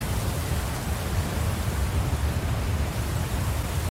Rocket; Criminality

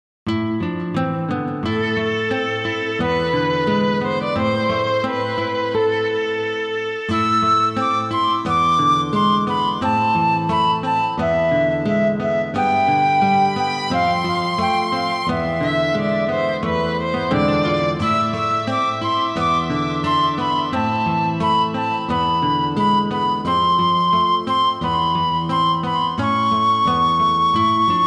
ballad; Momotaro Densetsu Hit Parade; Momotaro Densetsu 11 12

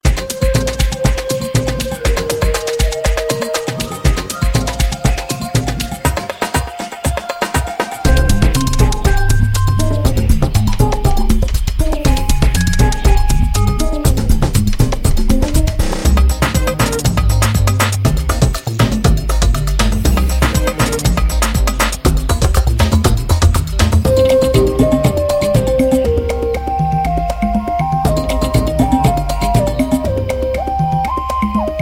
Jungle Music; Doki Doki Universe; PS Vita, PS3, PS4

